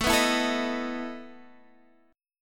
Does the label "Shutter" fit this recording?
No